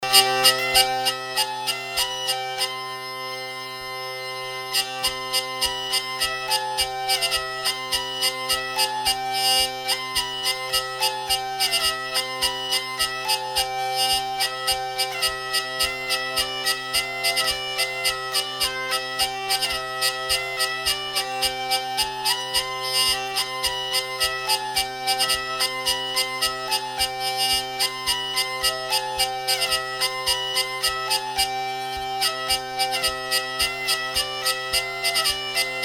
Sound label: mini c tune, vielle; hainzl